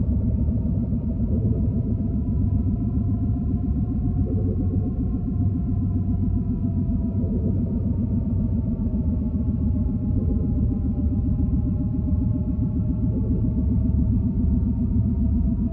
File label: ambience